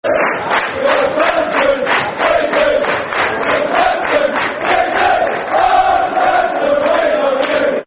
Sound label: We Love Southampton [Football Chant (Soccer Song)]; Southampton Sfct